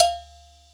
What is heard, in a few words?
kit, Yamaha Motif-ES8